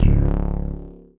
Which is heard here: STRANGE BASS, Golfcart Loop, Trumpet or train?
STRANGE BASS